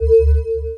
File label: Mana Burn; CSkil